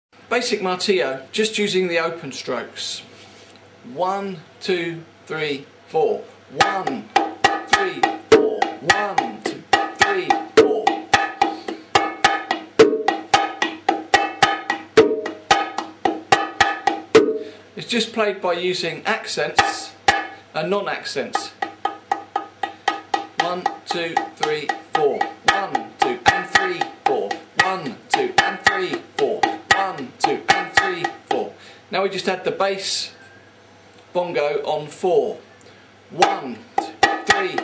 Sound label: simple martillo, bongo